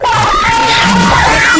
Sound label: jumpscare sound; five nights at finas